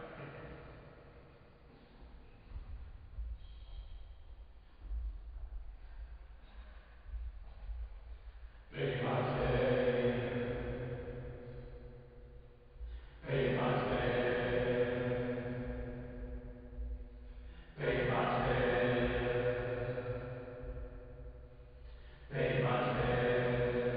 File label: Choir